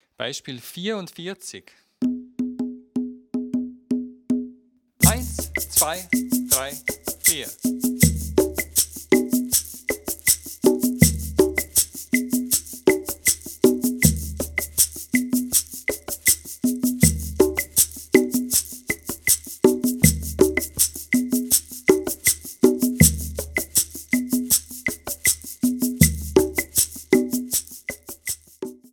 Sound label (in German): viererrhythmus